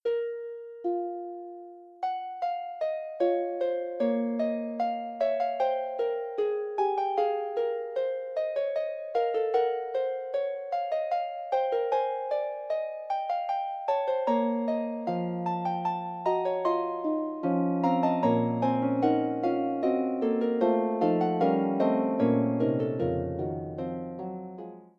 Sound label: Fugue, Harp; Forty Eight Preludes and Fugues Book 1; prelude and fugue no 22 in bb minor book 1